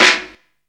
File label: brute, snares; Zero-G - Funky Elements